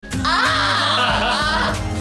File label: waxing pain